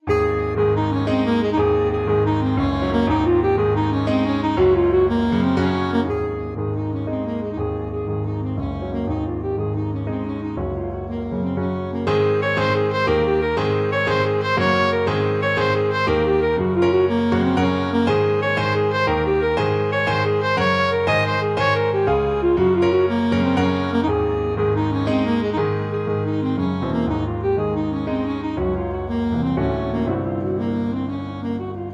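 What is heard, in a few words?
blast of wind alto saxophone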